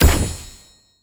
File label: bumper car quit; taunt; Garry's Mod; tf2